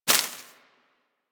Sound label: Bullet Impact Debris Grass; Warframe; Weapons